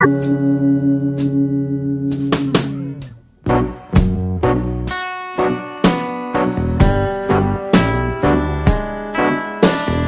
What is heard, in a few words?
instrumental